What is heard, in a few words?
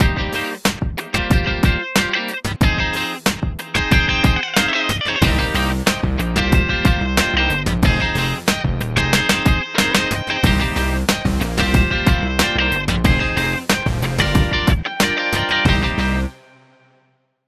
Nokia 6760 slide